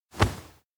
shoulder tap; anemoi

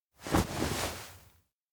face close; rikka; anemoi

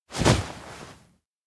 fall on; koyomi; anemoi パッケージ版

